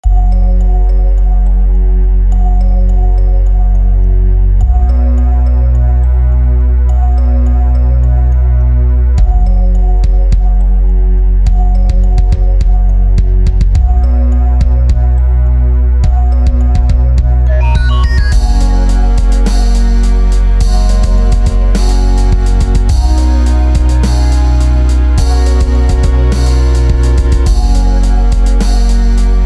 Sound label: ice cave; snow music